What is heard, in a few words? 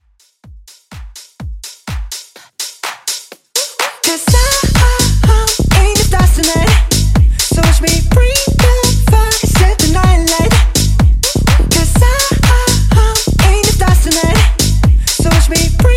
Top40 Tech House; Mashup